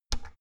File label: oven button press hard